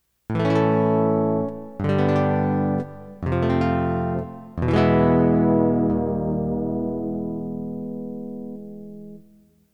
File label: gtr jaz